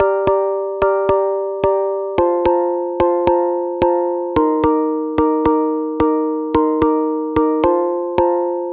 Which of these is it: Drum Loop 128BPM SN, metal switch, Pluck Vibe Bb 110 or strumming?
Pluck Vibe Bb 110